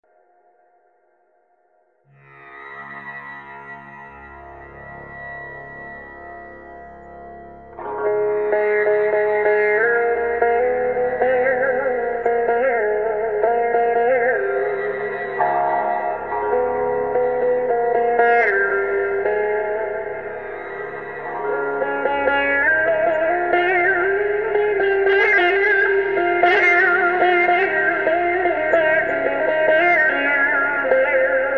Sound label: Line 6 Sitar Test, sitar metal